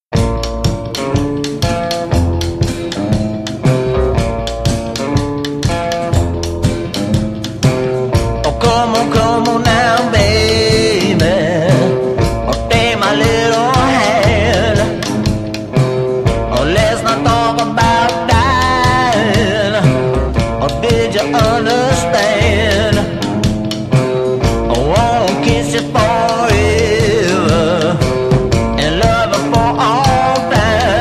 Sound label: heavy trash